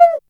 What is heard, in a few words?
XV Perc, Roland XV-5080